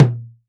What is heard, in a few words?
Tom Real, Drums; One Shots